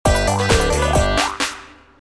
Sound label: Celebration Banjo (Short); Blastdozer; nintendo 64